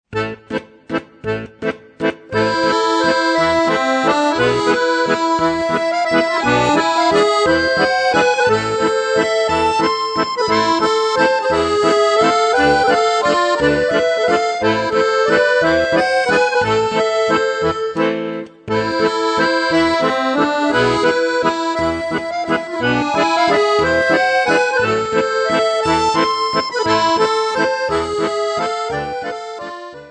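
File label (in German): Östländischer Walzer Russland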